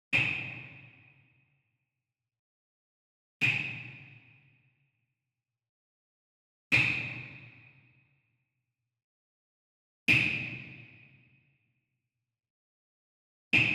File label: Wood Percussion Wood Block Japanese Resonant Hit Far; boom library; Cinematic Strikes Construction Kit